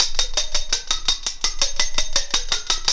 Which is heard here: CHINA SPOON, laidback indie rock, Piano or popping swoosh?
CHINA SPOON